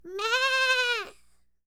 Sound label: LAMBS; Spectrasonics Vocal Planet; Jazz FX